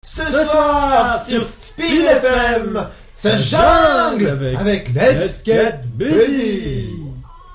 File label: jingle; musee